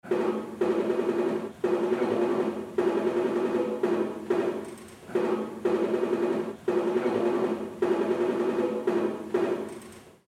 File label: TomTom